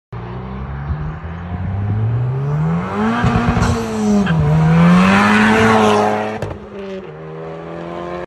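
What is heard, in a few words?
Honda Civic, exhaust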